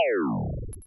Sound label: death